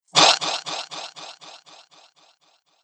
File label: Rough Splat Fast Delay, cartoon